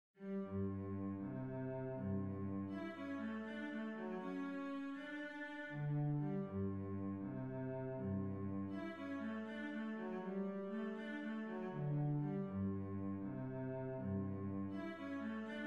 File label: gigue sol; telemann georg philipp